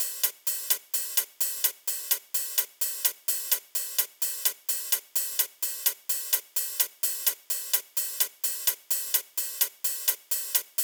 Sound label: 128 BPM HH; Root Amin; Vengeance - Essential Clubsounds Vol.5; Demo Constr Kits; Highway Kit; Drums & Perc